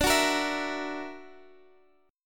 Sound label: F sharp Augmented 7th D, chord